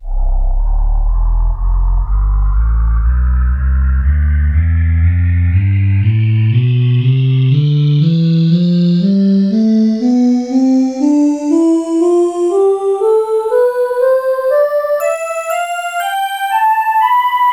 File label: space voice tonleiter